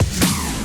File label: Big Snare